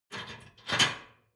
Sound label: dishwasher frame fold up soft